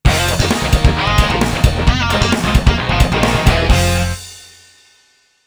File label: game win; Stack 'Em High; 3DS